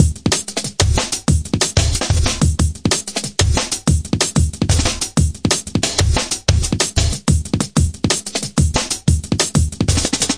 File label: 185, drum loops and breaks; 181 bpm plus